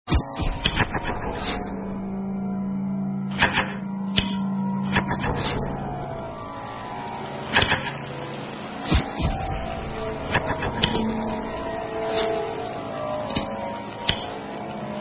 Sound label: Lighter Music